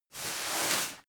broom sweep soft

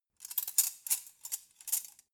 cutlery forks handling

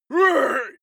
male shout hit

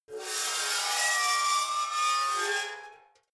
metal scrape rich slow

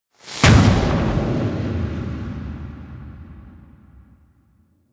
missile small fire stationary close

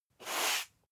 broom sweep slow single

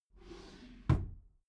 desk drawer close regular

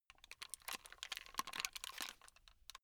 plastic rattle slow short

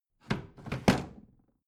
bathroom drawer open regular

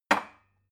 glass put down cupboard hard

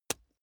wood impact small lash

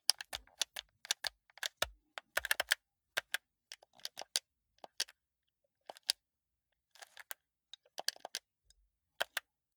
console controller play sequence moderate

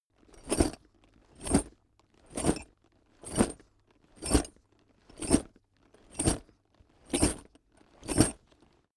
backpack metal sequence

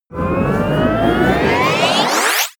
tape rewind

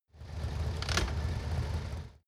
motorcycle harley kickstand extend

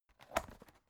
folder open

